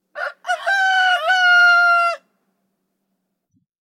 Rooster Crowing Morning; birds

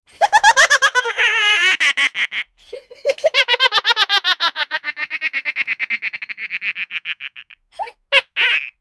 Cartoon Laugh Sound

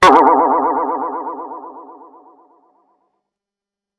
Boing Jump Sound, cartoon

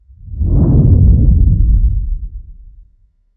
Thunderous Slow Swoosh; slow motion transition

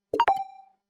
Soft UI Switch

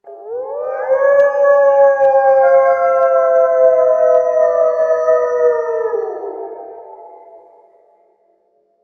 Wolves Howling in Forest; forest jungle ambience

